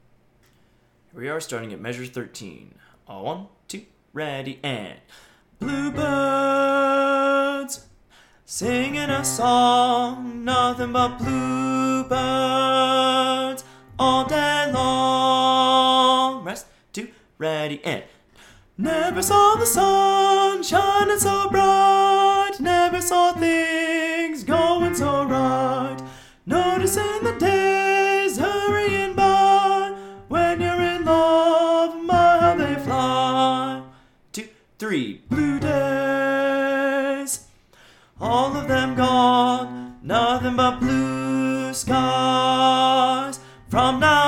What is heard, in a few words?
Baritone; junior high chorus